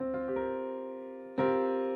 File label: c7sus4